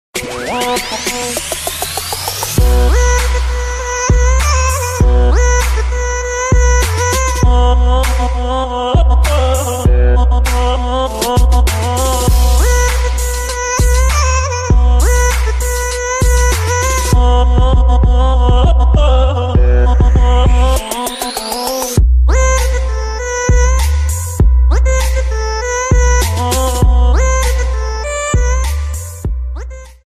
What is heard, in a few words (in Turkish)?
Zil Sesi, Telefon Zil Sesleri; Populer Telefon Zil Muzikleri